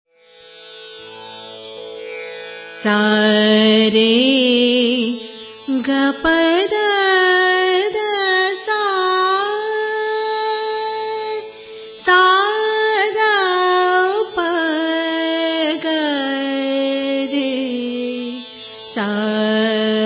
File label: bhupali, raga